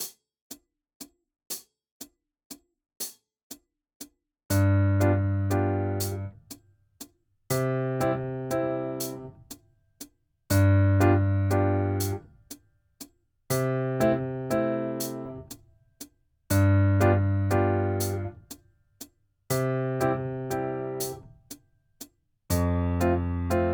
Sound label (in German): 4 Toene Begl.